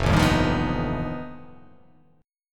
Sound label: F 9th Flat 5th F down